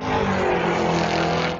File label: circuit car; BALLY; CORVETTE; Corvette-Original